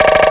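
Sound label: CONGA DRUM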